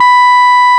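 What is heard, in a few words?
RMI piano B 4, EP; Sonic Reality - Vintage Timetraveler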